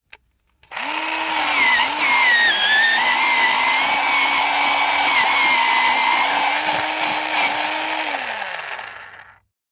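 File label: electric sharpener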